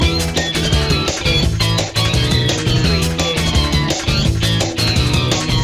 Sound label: surf loop